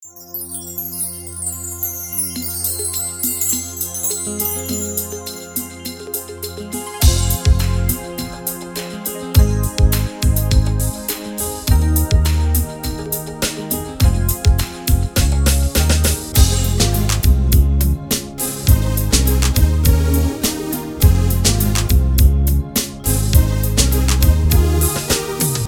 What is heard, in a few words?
Afro Pop; AjamSonic